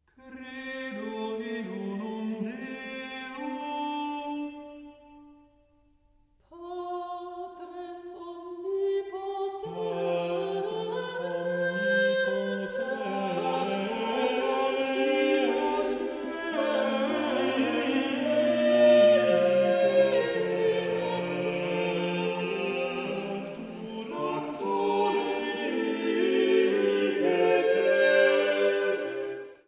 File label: Credo; In dulci jubilo; Credo aus der Missa super 'Dixit Maria ad Angelum'